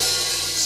Open Hat

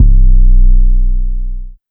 808s

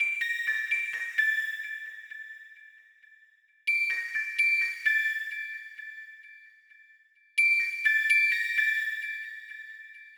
Loop

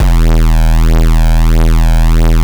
808's

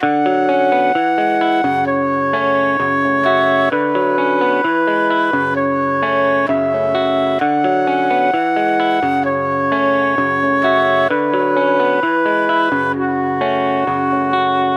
Melody Loop 130 BPM F# Min; Cymatics - Astro Mojave

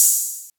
hihat one shot hihat bounce, Closed Hats

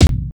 KIK; Kicks

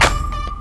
Hit

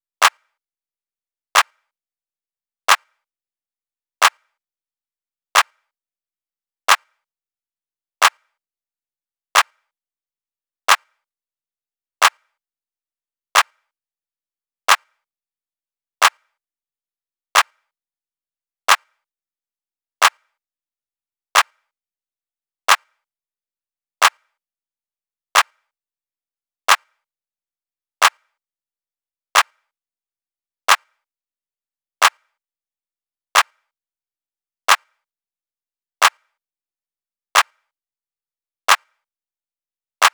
clap loop bounce 90; Claps

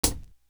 hat, closed hats

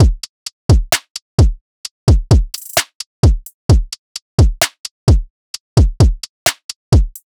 Full Drum Loop 130 BPM; Cymatics

